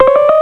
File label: Four Piano Notes; Twenty-five Beeps #2